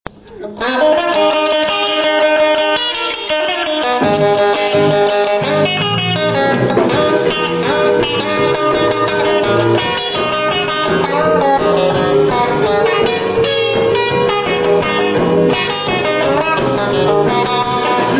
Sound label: twang, squier; 86 squier